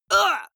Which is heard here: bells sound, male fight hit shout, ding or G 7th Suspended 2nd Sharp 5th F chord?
male fight hit shout